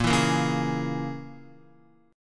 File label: Bb Minor 7th Flat 5th, Guitar; Bbm7b5 strummed